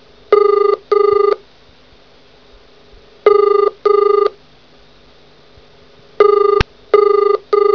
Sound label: double ring, telecom